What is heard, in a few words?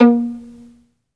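SOLO VIOLIN, Synclavier Strings; ILIO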